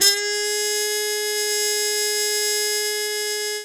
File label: FX G#1, Trumpet MuteFX; E-MU Formula 4000 Series Vol. 1; Hip Hop Nation